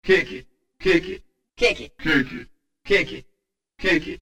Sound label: Kick It!!; Alesis Rap Techno Dance